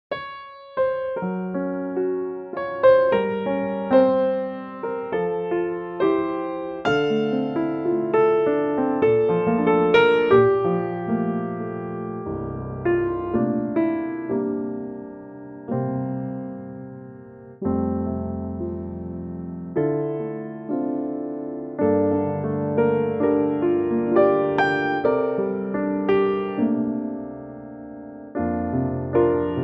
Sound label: F piano; key - F - vocal range - A to Bb; Beautiful and reflective piano only arrangement